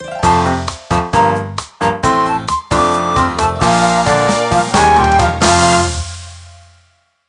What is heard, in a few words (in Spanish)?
Tema de victoria; Animal Crossing; SSB4